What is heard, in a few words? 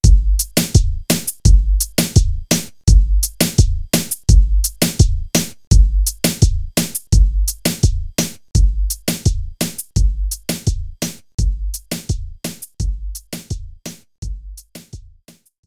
Reggaeton Loop 4 count; zumba fitness join the party; ps3; wii; xbox 360